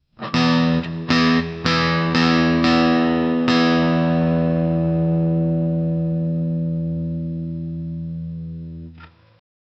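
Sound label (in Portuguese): violão tocando duas notas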